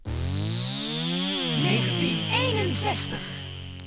jinglepackage; topformat LP jinglepackage 1

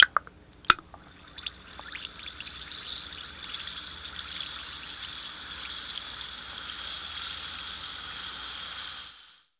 CAN OPEN, KITCHEN; AKAI; 1000 Sound Effects

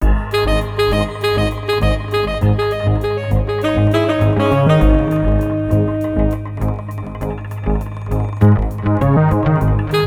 Tension loop; Rosegarden; russia

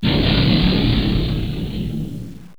millennium falcon; Star Wars

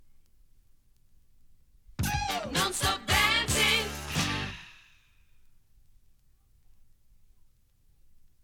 topformat maxi jingle single

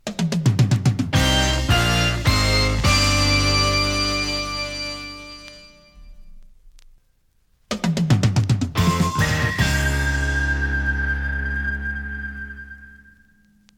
topformat maxi jingle single

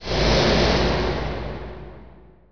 scrape metal

